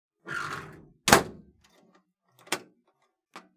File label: locker; 扉を閉じて鍵を閉める音